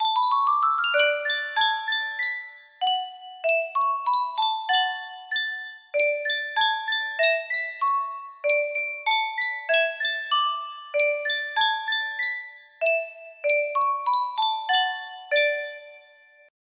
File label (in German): Yunsheng Spieluhr